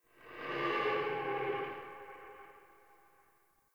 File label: Stag Vocal Pain Idle Echo